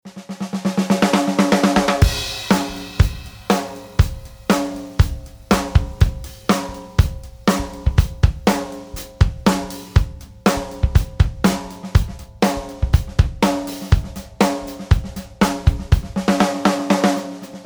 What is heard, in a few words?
Fidock 6 1/2x14 blackwood